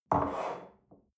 espresso place on table slide soft, restaurant cafe